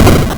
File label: Hard PCM Chip; chiptune